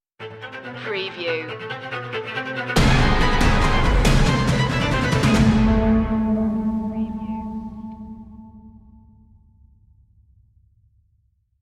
storm stinger

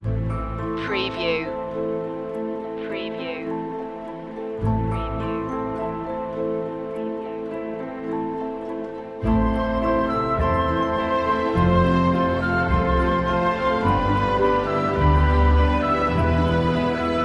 woods theme dreamy

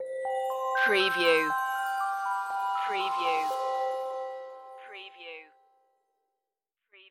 fairy dream magic stinger

